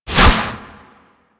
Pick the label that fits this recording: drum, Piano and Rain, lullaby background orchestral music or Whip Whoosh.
Whip Whoosh